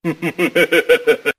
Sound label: laugh devil